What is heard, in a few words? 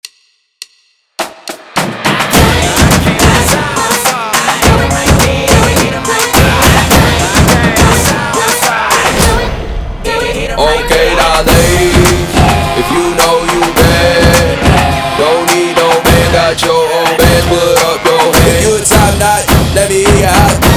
GameDay Hip Hop Mix; McIntosh